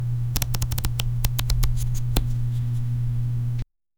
card shuffle; dealing

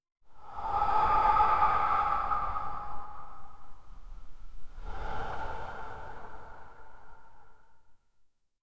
pained guttural gasp mut; strained

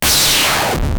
game block destroy

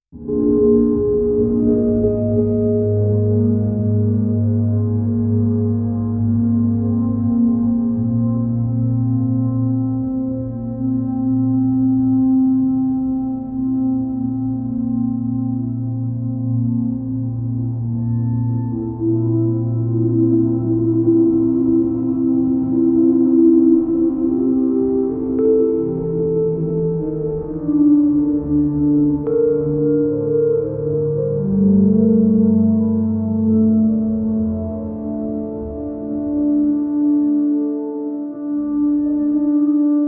ambient music monotonous

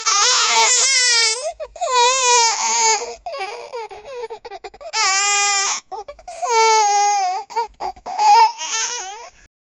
baby crying as it gets; blackhole

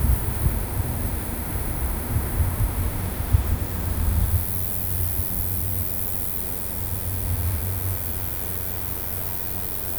a quiet peaceful meadow

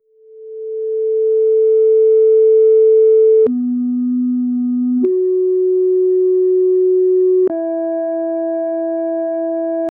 an ai loading effect for, repeatable

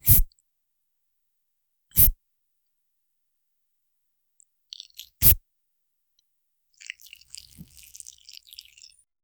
simulate the soft wet sound, beauty

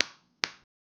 plastic hit another plastic, impact

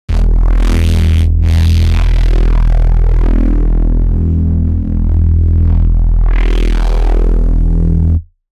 heavy club trance bass

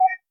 ui button, button

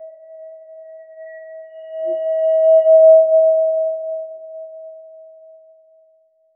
short ui sound, fade

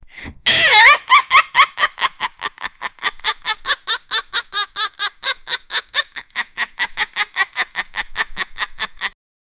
kid laugh sound

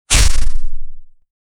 the sword cuts the air, sword slice

